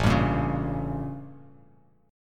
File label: A 7th Flat 9th A, Bass Standard; strummed